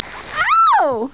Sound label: oooh; Leisure Suit Larry 6 - Shape Up or Slip Out; Floppy DOS; scummvm